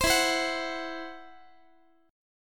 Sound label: E Suspended 2nd Sharp 5th E, down, Guitar; Esus2#5 strummed